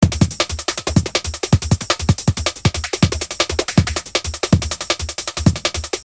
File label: Drum n Base No Swing